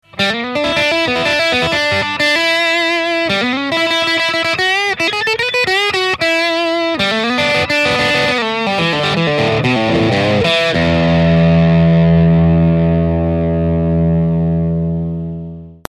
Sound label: Soft Drive Full Fulldrive